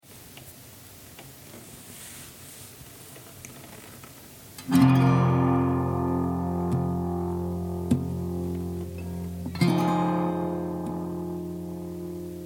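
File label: F 100 guitar chords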